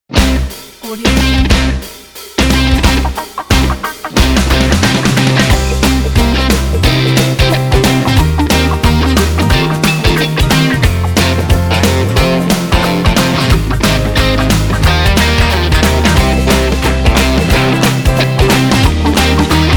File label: energetic positive rock intro logo